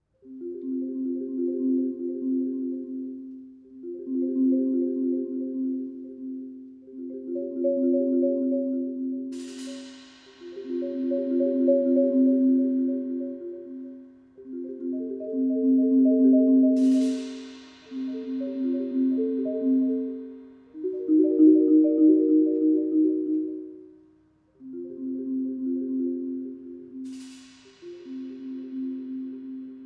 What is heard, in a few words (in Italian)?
Percussioni